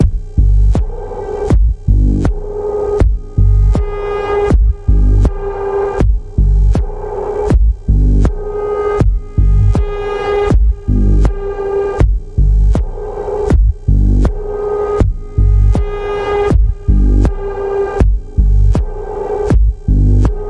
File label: Throbbing Pulse G, Key of G